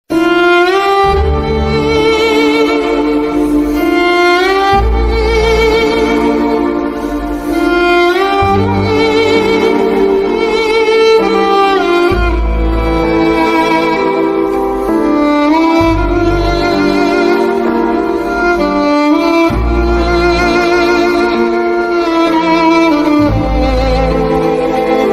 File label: Very Emotional Sad Background Music